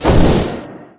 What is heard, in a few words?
projectile launch; Dota 2; Outworld Devourer